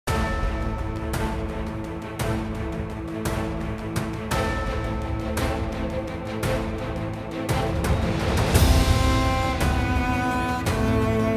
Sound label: music; chaosbear